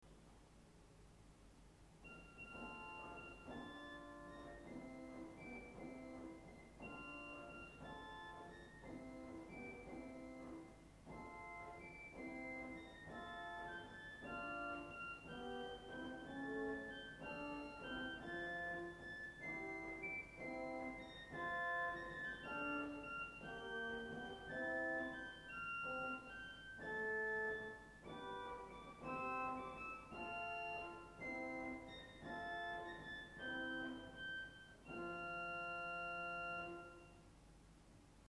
Dolce 4 SW, Orgelstimmen

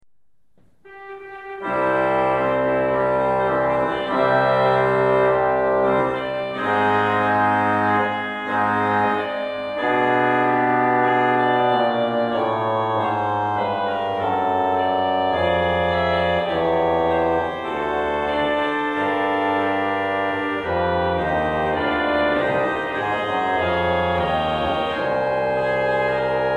Trompete 8

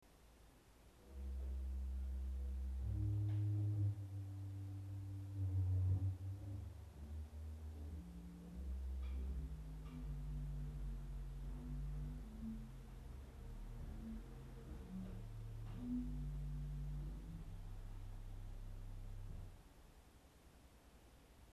Subb 16, Orgelstimmen

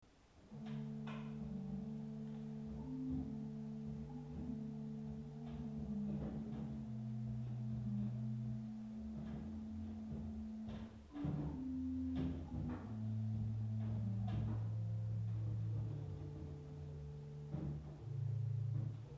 Gedbas 8, Orgelstimmen

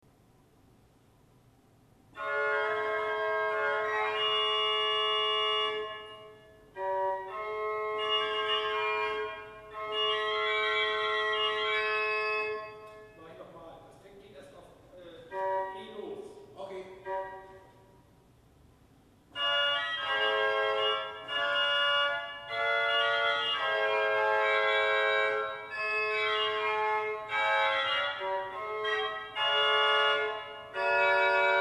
Cornet 3f HW, Orgelstimmen

